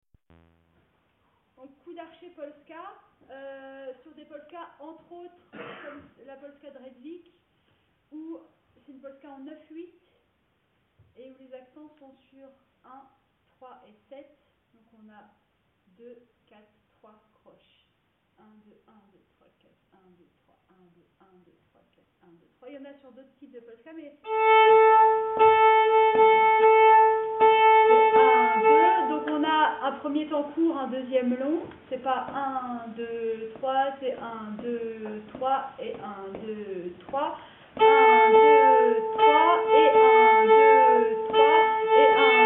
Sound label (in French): Polska Archet, nyckelharpa; RattwikPolskaArchet